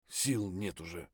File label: bear low hp; eft